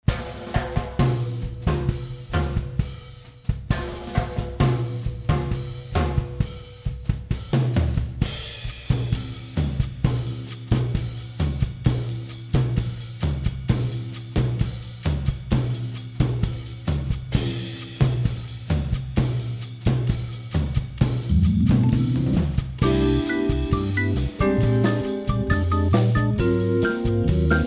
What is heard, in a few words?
Bossa